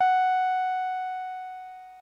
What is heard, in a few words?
ebm, Omnichord; OmnichordGodot